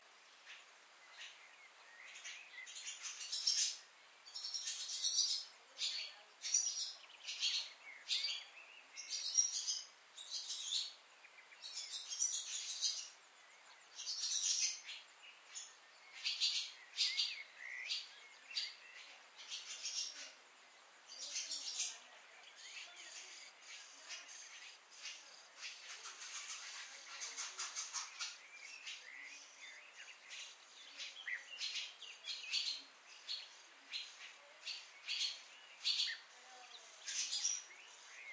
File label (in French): hirondelle rustique